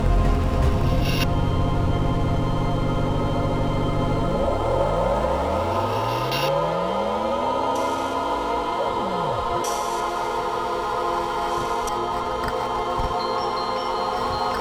Waterworks Murky Slow; rive wreck hack die retry; ps4

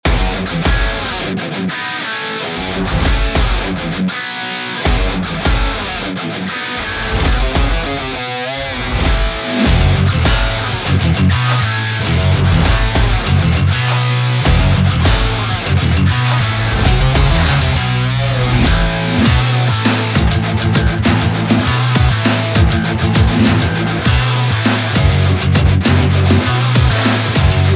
Racing; quick race linux macos ps4 switch windows gamerip 2021

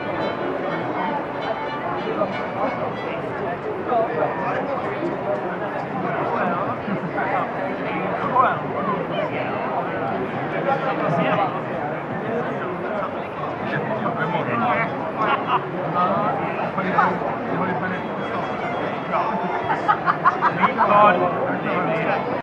ambiences medieval village loop; the hardest dungeon

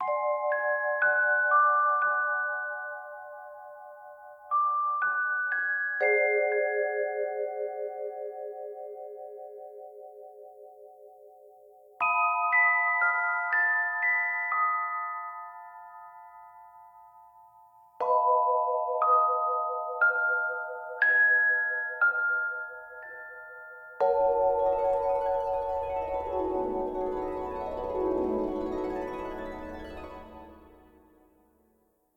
Loop, Vibraphone, Celesta, Harp Stem; ps4 ps5 switch windows xbox one xbox series xs gamerip 2024; Grandpa's Note

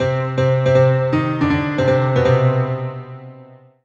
Solo Piano Negative; MUSIC EFFECT; startide

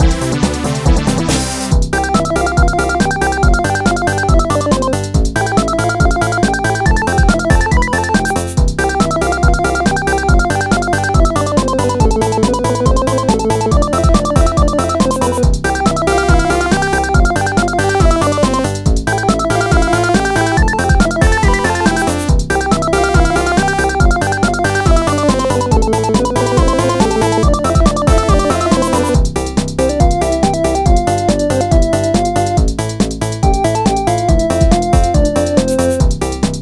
Bouncy Arcade; Austin's Candy Quest

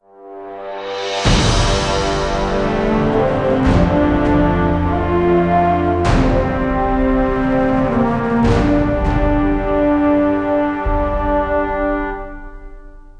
Results Lose Sting

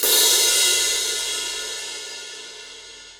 Crash; Torchlight III; PS4

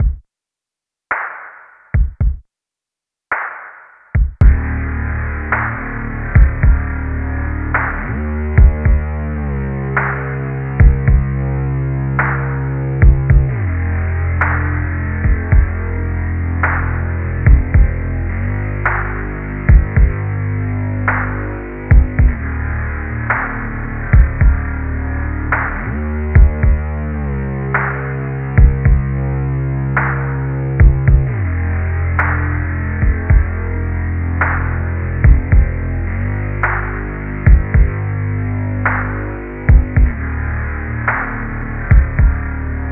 Elevator Floor; Splatoon 3; Side Order

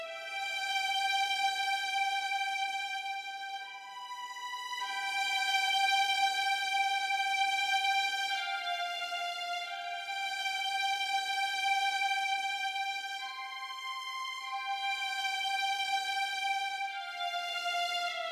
Violen; Toki Tori 2

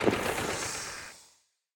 Auto Harvester End

